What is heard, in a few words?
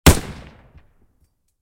mauser 43 rifle single shot; sound-ideas